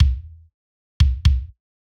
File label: kick EQ Comp